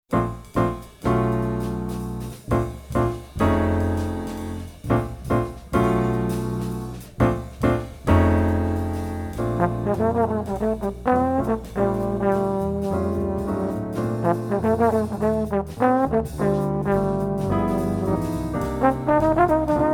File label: Waltz